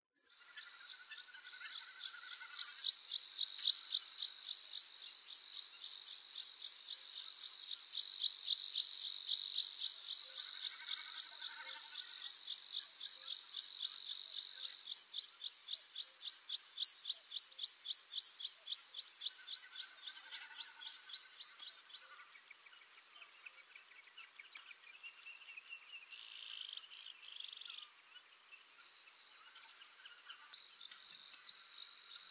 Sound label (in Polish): swiergotek lakowy